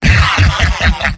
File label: m laugh evil; demoman; tf2; garrysmod; mvm